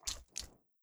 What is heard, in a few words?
small blood splatter